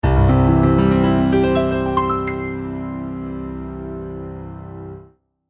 Piano Roll Intro, Piano roll up the scale in key of C.; Pianos; Musical